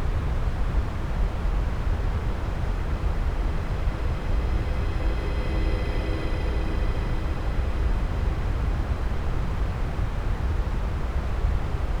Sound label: Approaching electric vehicle